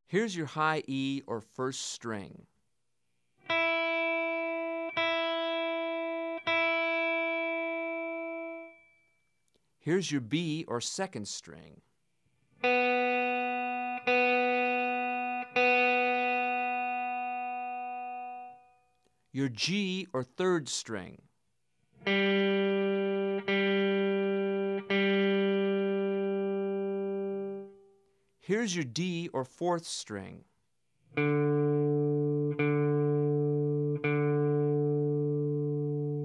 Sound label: tuning